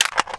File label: aks74u clip out, weapons